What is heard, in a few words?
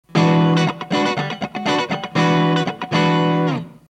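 1458, CLEAN, LM1458N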